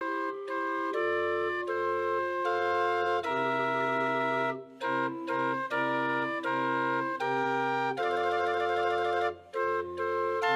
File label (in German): drehorgel musik happy birthday; drehoergelimarkus